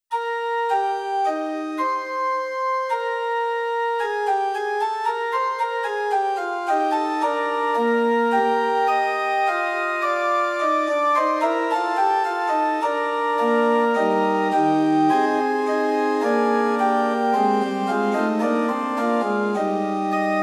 Liturgical Organ Books, Pitch 440 Temperament Equal